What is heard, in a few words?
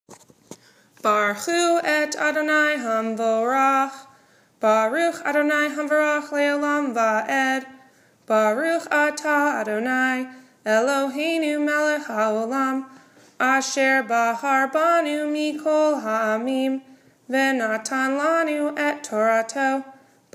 Before Torah Blessing